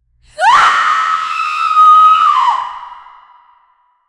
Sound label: a whoa female scream; sound effect